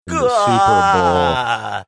funny sound

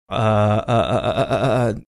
making sound while thinking

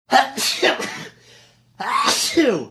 sneezing

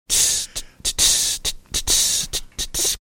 making hi hat sounds

